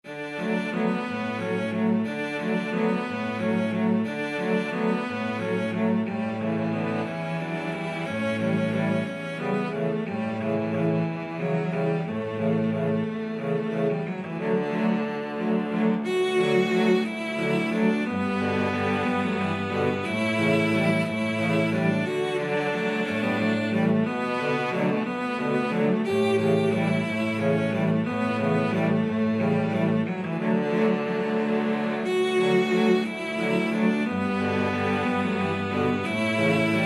cello quartet

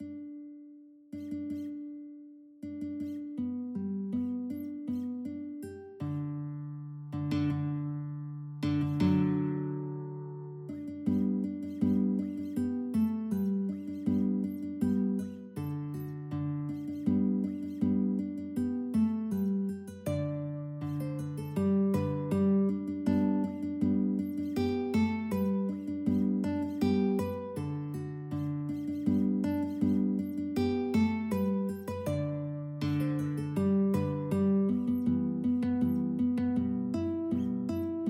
william tell, guitar

